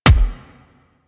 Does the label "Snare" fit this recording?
No